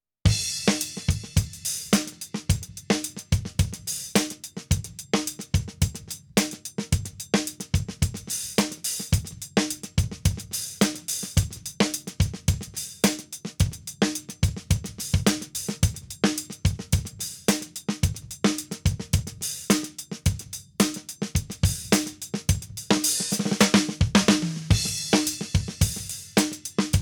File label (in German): Studio Comp Schlagzeug Bypass; Behr Studio Comp